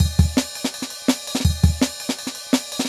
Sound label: 166, Jungle Breaks; 90s Sample CDs; Original Jungle Breaks